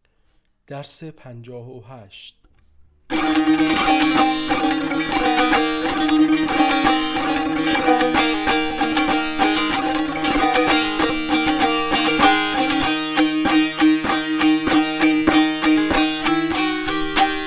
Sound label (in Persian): مشق تنبور